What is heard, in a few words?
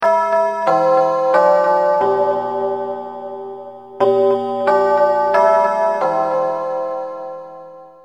chime, electronic, school